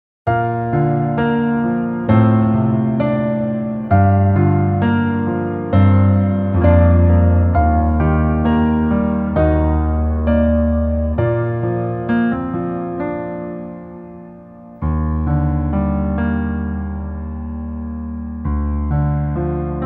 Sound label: Piano ver